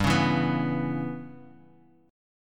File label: G 6th Flat 5th G; x,10,11,9,11,x,x,x; down